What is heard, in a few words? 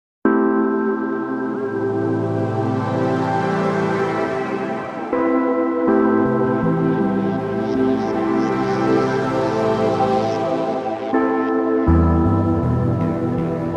Retrowave Melodics; Beta Retrowave Melodics Vol 2